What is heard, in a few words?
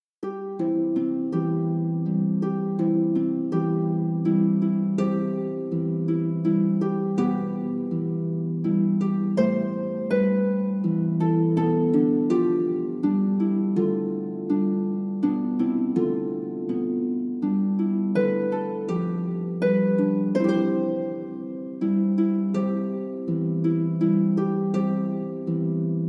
Lullaby (Harp)